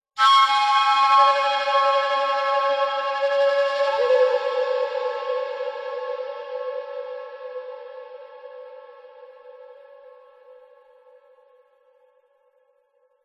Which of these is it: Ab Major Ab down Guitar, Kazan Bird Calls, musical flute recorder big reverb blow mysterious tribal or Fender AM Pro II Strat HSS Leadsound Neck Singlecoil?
musical flute recorder big reverb blow mysterious tribal